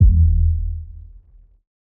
Rubberband Bass One Shot; Kounts Sounds of the Week (Episode 17)

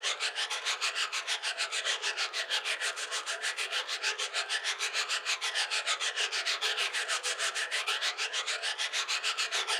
Brushbox Perc Loop; Vignette Kit

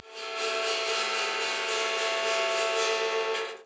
Spring Fx; Vignette Kit

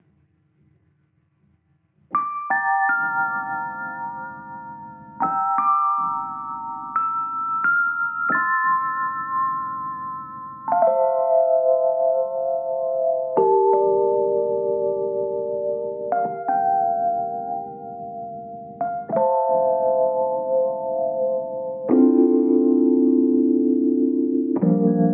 Crunch Rhodes Loop; Vignette Kit

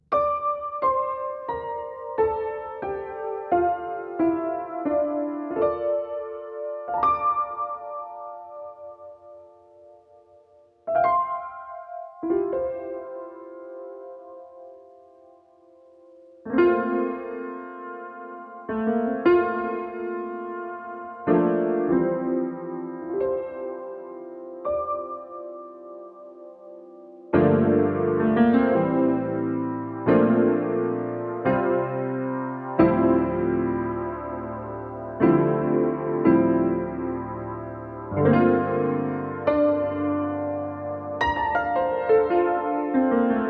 Keys Loop; Vignette Kit

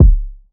Civil Kick; Vignette Kit

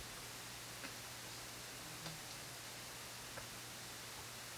Akai Tape Hiss; Kount's Home Stretch Sample Pack

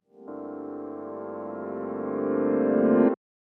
Noire Reverse Keys Chord; Vignette Kit

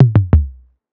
Classy Tom Fill; Vignette Kit

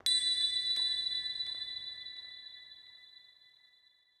Finger Cymbal; Kounts Sounds of the Week (Episode 17)

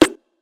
Posh Snare; Vignette Kit

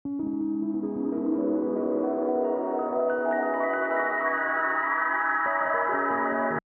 Key Flourish; Vignette Kit

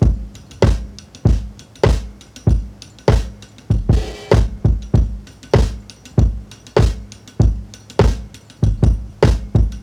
Heavy Step Break; Kount's Reel Break Library

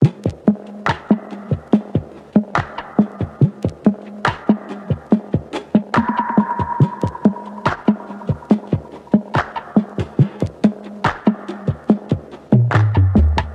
Percussion Loop; Kount Drums Vol 5

